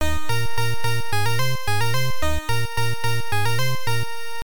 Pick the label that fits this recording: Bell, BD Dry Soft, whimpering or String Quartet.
Bell